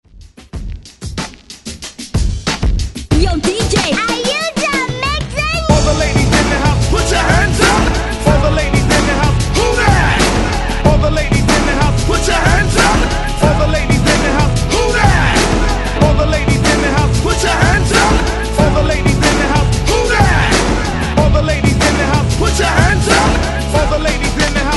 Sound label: Hype Redrum 93 Bpm; DJDOLLS